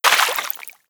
debris water, bullet impact debris

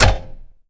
locked

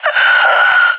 death; fbihrt epic

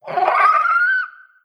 waiting call, headcrab reviver; creatures; vox

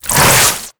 poison projectile passby

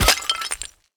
tile impact bullet; physics; surfaces

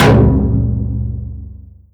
impact, metal barrel; physics; props

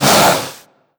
hiss long, headcrab reviver; creatures; vox

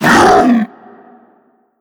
alert, zombie vox; creatures

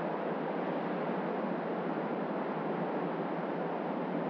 dry air short; ambient

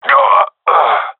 death; Counter Strike; CS:GO; seal diver

